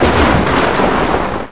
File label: Thunder; Zelda